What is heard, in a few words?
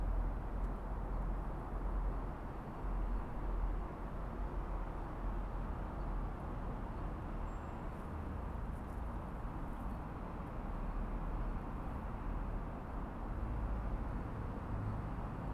Stereo, walk; ZOOM F6 MultiTrack Field Recorder